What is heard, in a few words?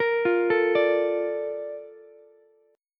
A sharp Major 7th, Ukulele; A# M7 arpeggiated